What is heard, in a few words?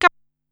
"Cap", Korg Wavestation-SR Drum Kit